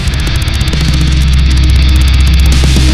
metal hit

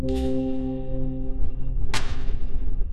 whatsapp tone; sound effects

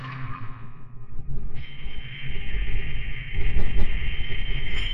crickets; awkward sounds

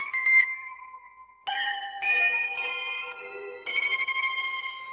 kids cheering; sound effects

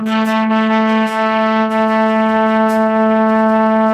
sad trombone

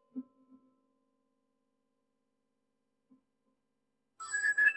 alarm bell

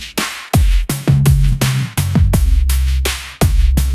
bass drop

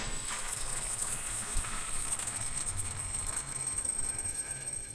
footsteps

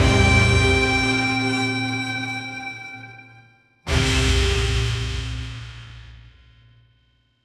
epic sting; sound effects

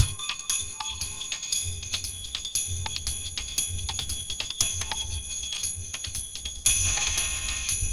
happy jingle; sound effects

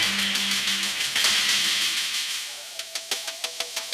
thunder

